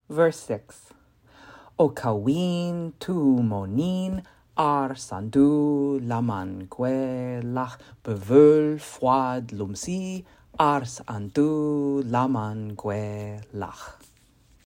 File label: Chant